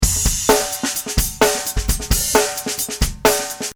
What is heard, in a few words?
rust drum 130